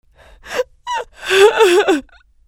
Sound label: PEOPLE